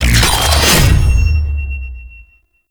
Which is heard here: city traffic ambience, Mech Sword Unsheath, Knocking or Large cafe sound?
Mech Sword Unsheath